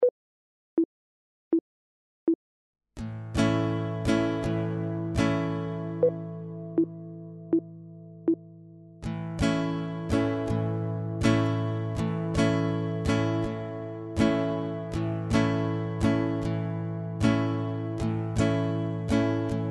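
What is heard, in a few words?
chord, guitar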